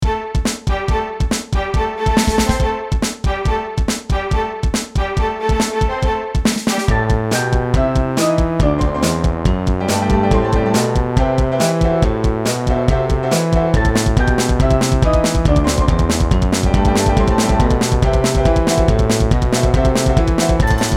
boss theme remix; metal sonic; sonic cd

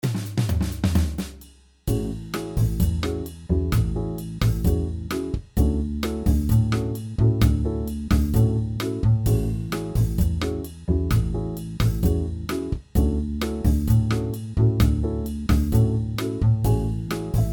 bossa nova

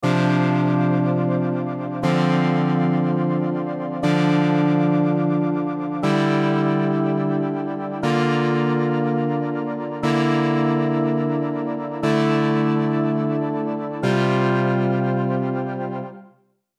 lost chords

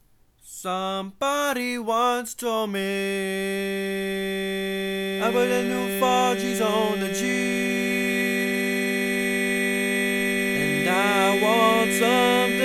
choir of idiocy

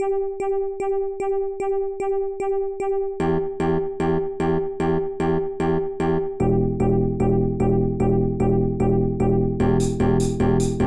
beepbox song

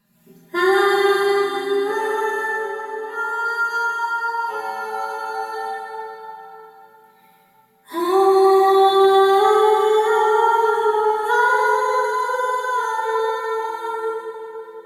sing

